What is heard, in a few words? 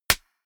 clap, percussion